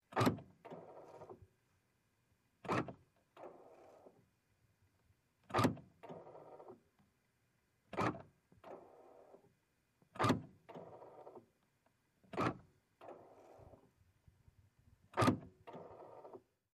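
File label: Car Door Locks Powered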